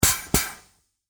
Pneumatic Grease, Scifi, Pneumatic, Air, Burst, Science, Fiction; Spacecraft; Spacecrafts; BlastWave